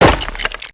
Crates n Boxes, destructibles

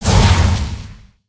super cannon fire; destructibles

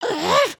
generic build

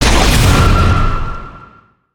Spear Throw; weapons; aronwk collection; ndaudio

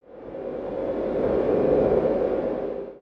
Wind Cave One Shot; Lego; ambience

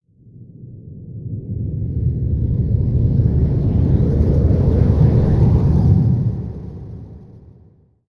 Creep Rumble; ambience

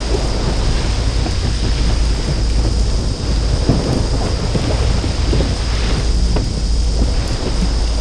Ice Water

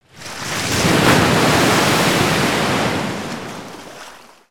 Pirate Cove Big Wave; gnarled forest

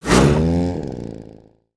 Space Marauder Swing; Valiant; aronwk collection; ndaudio

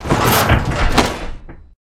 rocket climb sequence generic

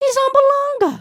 sway, emotes

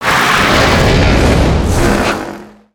Moto Rush; Valiant Daredevil

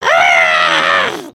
frustrated; emotes

